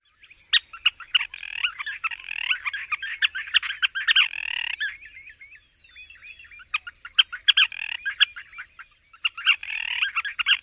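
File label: interaction calls, Scissor-tailed Flycatcher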